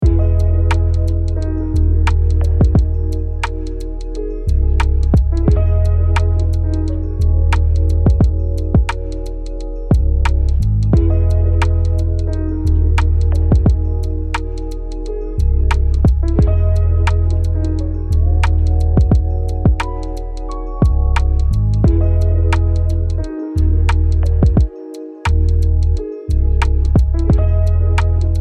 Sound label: Hip Hop; Vol2 1784 Main